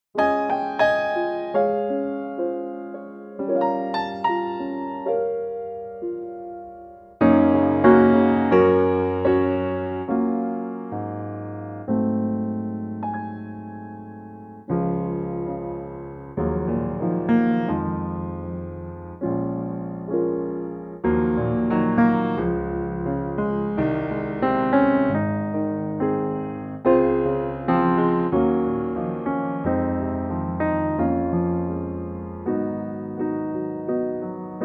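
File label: piano Db, backing track; key - Db - vocal range - Ab to Eb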